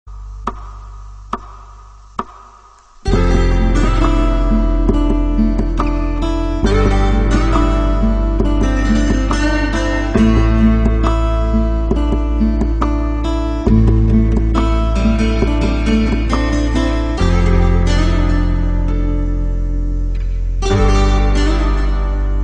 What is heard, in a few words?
acoustic ringtone